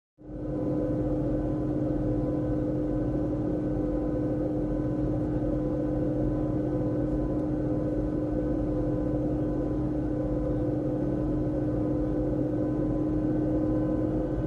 Jet, 727, Take Off, Interior Perspective; Roar With Engine Whine And Acceleration.